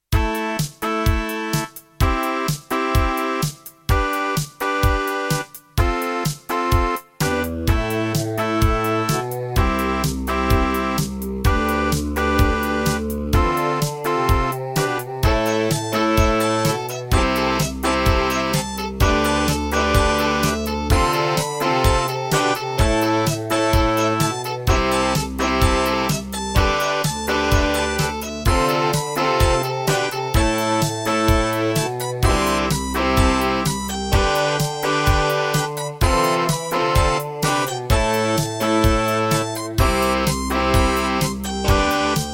Wave 1, Yamaha CBX-T3; Raptor

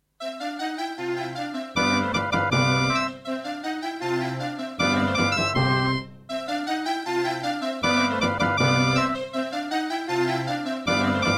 Mozart - Concerto K467 partial, Doepfer GMX-1

